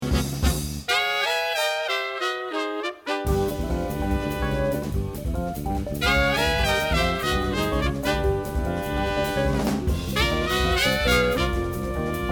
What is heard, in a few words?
A Minor Boogie Riff